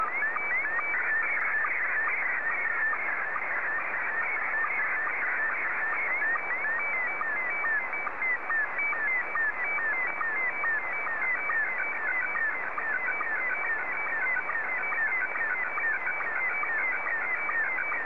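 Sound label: SSTV, Scottie 2; JA3QJQ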